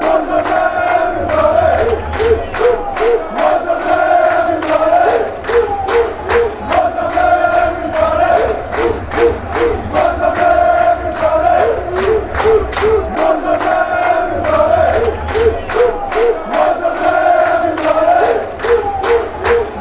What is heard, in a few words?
anderlecht chant